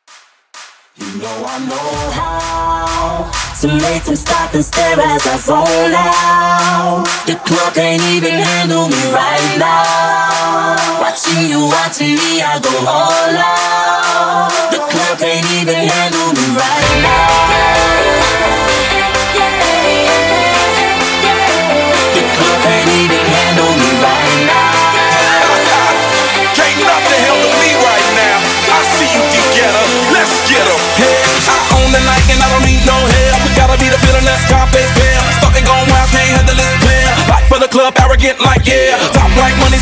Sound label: Throwback Dance Epic Intro 129 BPM